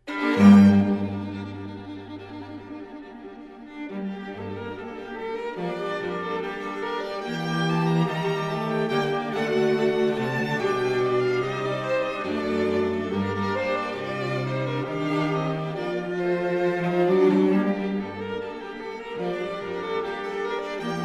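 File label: string quartet; foote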